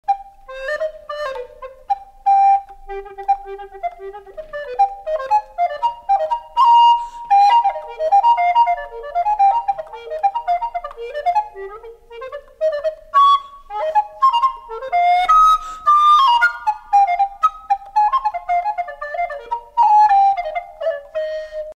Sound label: Flute recorder